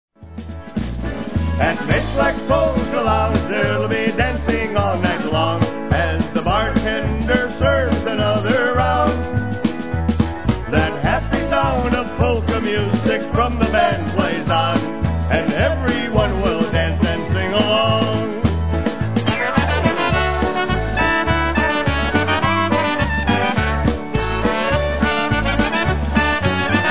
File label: Polka; Mayslacks Polka Lounge